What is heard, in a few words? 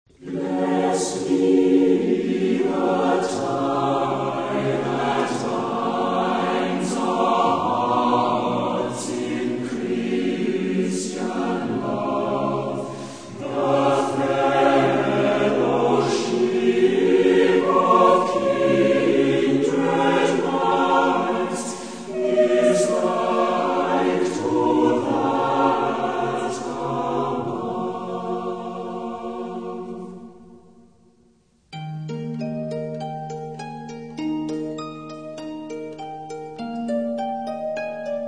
Orchestra and Choir, Hymns